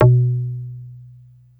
BASS; Africa bass

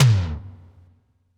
Tom Slam Dark

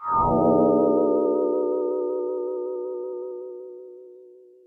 SYNTH; Stetlz Moodz Misty

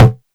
Perc; EFM

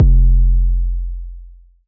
KICK F; Turbo

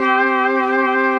Desert Pad Trumpet C4

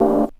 Juno106 Dark House Vinyl Synths G1; vinyl chords

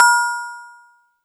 Cowbell

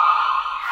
Ice Pick Trumpet D#3

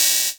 Hihat Open FX

